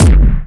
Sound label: fullest; Pro-Rec - Synthsonic